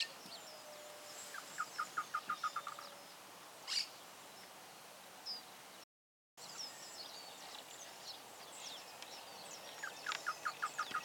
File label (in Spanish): chotoy voz; aves